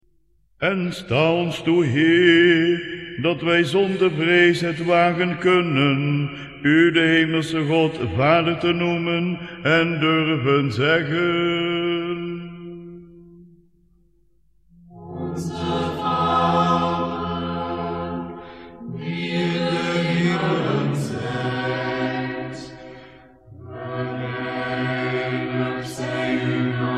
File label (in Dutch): Koor Onze Vader; Koor Liturgie NL; RimskyKorsakov